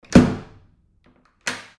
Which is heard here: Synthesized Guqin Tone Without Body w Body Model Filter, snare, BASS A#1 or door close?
door close